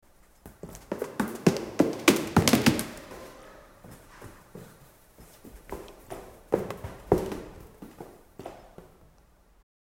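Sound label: santa claus falls down the, christmas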